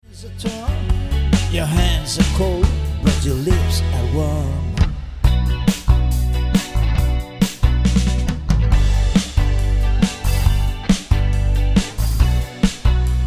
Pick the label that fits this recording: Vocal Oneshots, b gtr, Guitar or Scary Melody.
Guitar